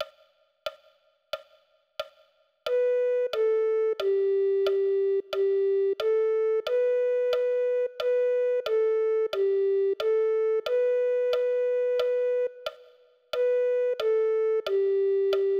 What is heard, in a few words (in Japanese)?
リコーダー, きれいなソラシ